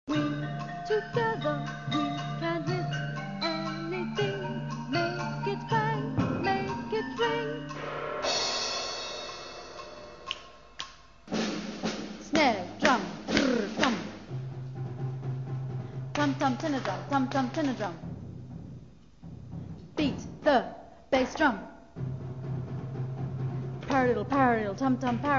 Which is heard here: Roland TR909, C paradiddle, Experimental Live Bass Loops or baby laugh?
C paradiddle